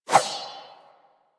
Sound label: Rapier Whoosh; Swords